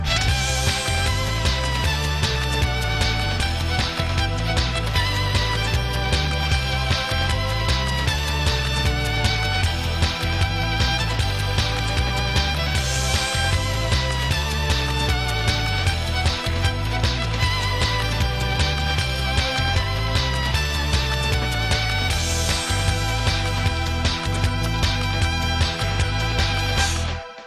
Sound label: Cool Techno